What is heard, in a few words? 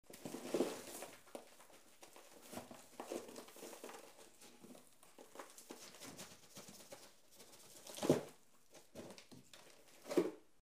Washing powder is taken, poured into the washing machine tray; laundry